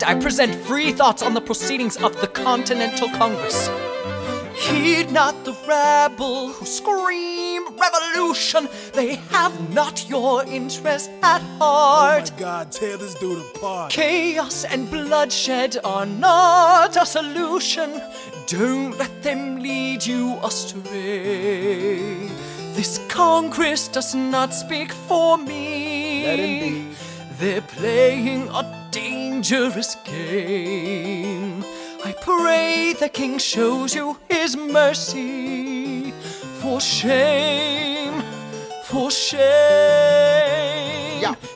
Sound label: vocal